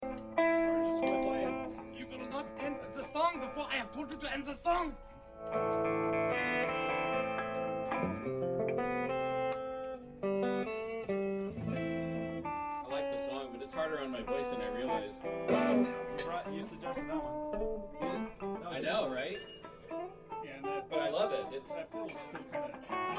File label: Room Mic